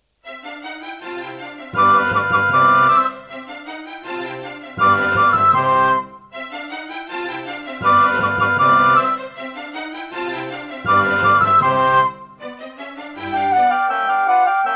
Mozart - Concerto K467 partial, Serdaco Dreamblaster X2GS; classical; BURAN V1.1